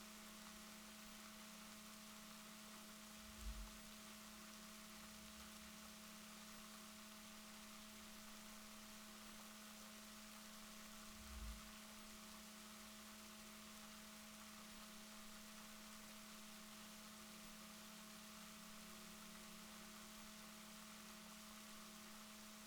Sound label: rorquals positif, Balaenoptera Physalus Chile